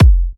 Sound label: F, kicks; markus hakala kicks